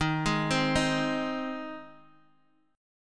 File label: Ab Major Eb